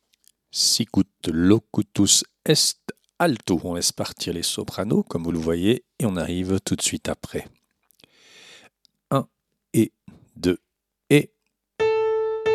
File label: Alto; Sicut Locutus est; Durante